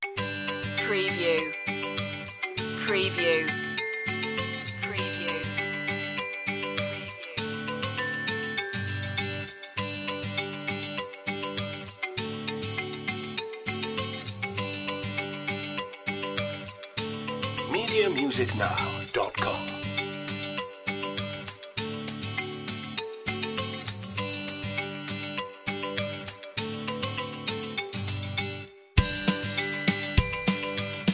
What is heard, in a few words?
instr